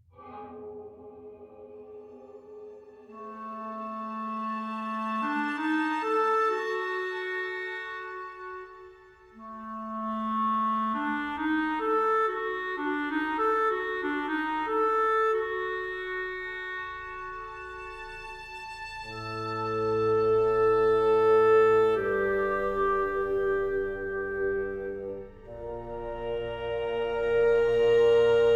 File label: Ghost Wood; Frozen Flame; windows